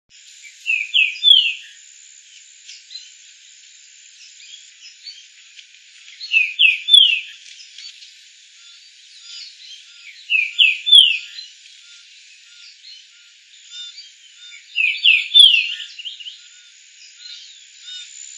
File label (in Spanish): pepitero verdoso